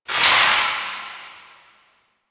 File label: Projectile Death Small; Weapons; Warframe; Duviri; Incarnon Adapters